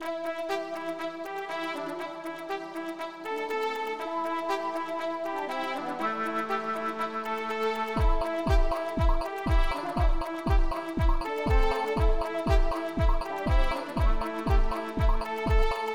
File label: Modern String Hop Loop